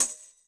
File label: tamb hit